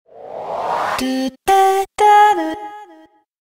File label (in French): signature sonore audio logo; sncf